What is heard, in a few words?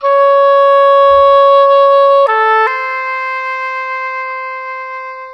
Oboe, Cinematic; Sonic Foundry (Sony Creative Software); Orchestral Series VOL-3 - Cinematic